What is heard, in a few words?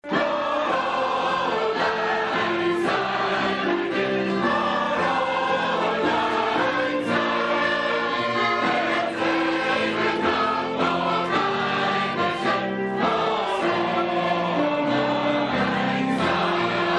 auld lang syne